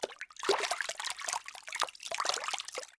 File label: fish